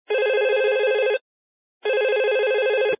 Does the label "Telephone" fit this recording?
Yes